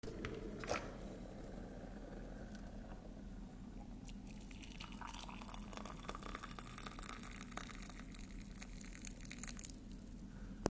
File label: kitchen